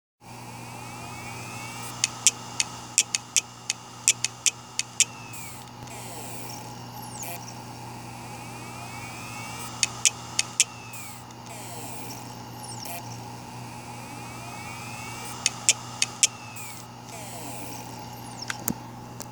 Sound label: Western Digital 80GB WD800